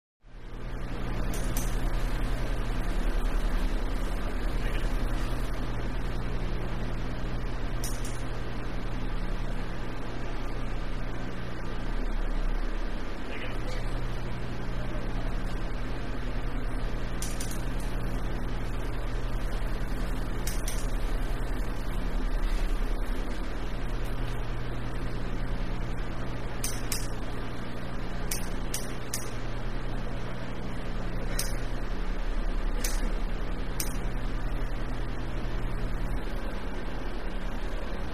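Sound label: Interior Commuter Train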